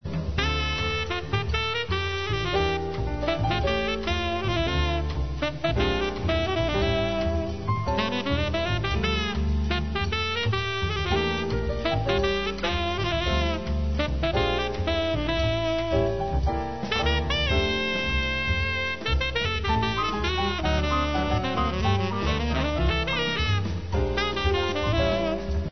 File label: Song